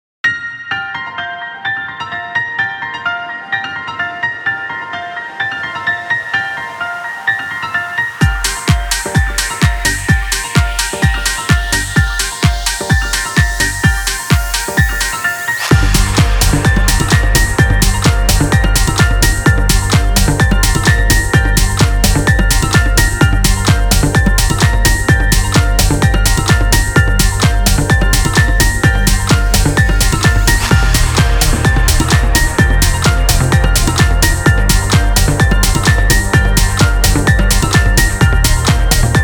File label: Error; Solaris(Virgin Noize); primary colors 2015